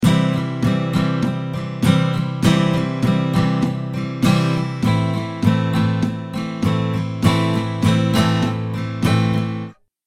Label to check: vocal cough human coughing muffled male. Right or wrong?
Wrong